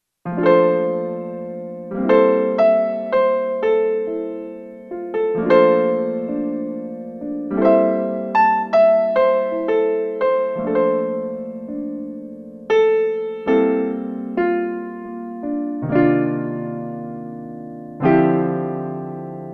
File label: interlude in G minor